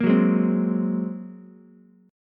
A sharp 7th Suspended 2nd Sharp 5th, down, Ukulele; strummed; chord; JGuitar